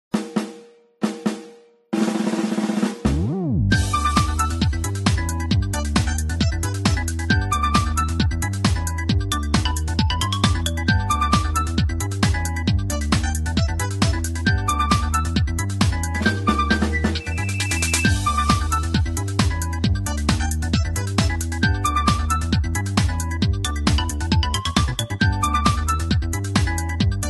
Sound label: Music; Rhythm Heaven Megamix